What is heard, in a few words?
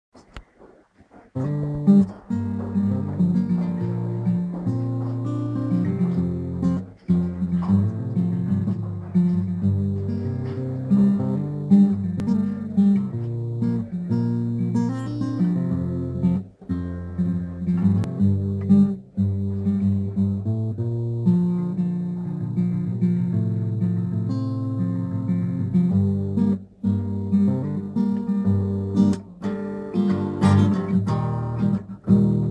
a brief acoustic interlude